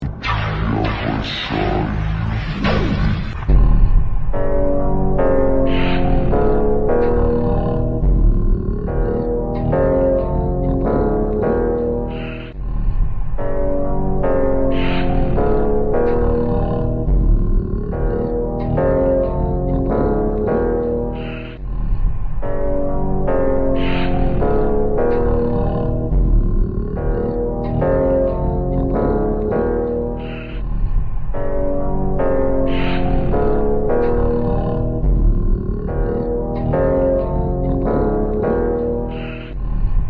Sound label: Phonk Music; فانک phonk